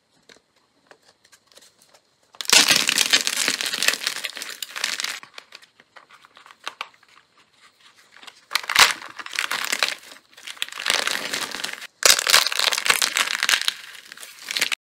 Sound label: chalk crunch; له کردن صابون گچی; اسمر